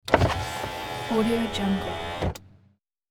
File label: automatic car window opens